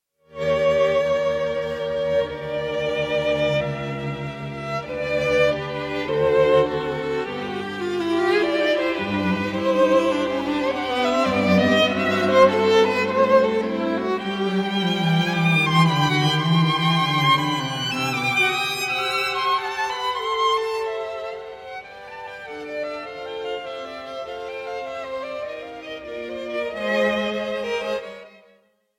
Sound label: string quartet no 12 in c minor quartettsatz; Borodin Quartet; Franz Schubert; D703